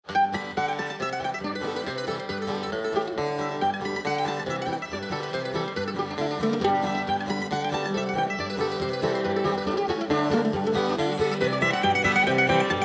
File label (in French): piece de mandoline inconnue